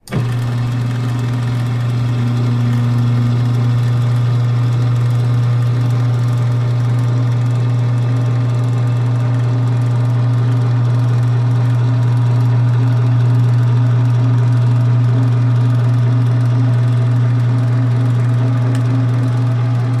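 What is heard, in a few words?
Dentist Chair Steady; seats & stools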